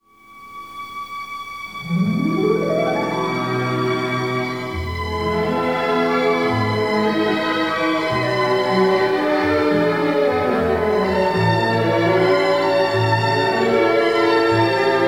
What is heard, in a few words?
Strings Easy listening